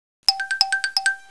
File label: Vibro Up